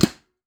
Steel Brush Flam, Snares; Ghosthack - Free Snares 2023

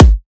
Riddim Kick

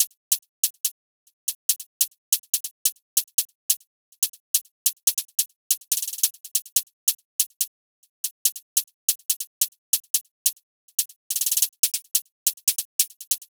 Drum Loop - 142 BPM Hihat, Drill; Loop Stems; Cymatics; PARADOX II - Drum Loop Collection; Yearbook

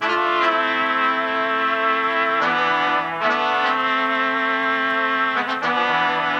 Various Melody Loop - 150 BPM A Min Brass; Melodies; Loop Stems; Cymatics; Oracle Sample Pack; Oracle Various Melody Loop 16

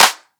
Riddim Clap

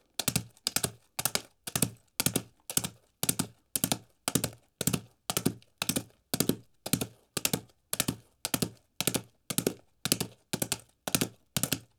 Horse gallop hard dirt; Fairlight Sound Lib

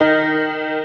Melody Shot D#, dubstep; One-Shots; Melodies; Murk; Ghosthack; Dubstep Sounds 2025

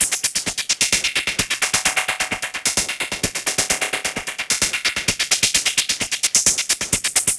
Perc Loop 130, techno

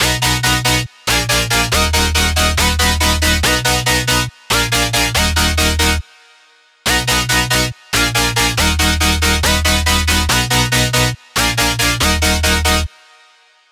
Future Bass Drop Loop, 140 BPM D# Min; Synths; Loops; Cymatics; EDM Starter Pack

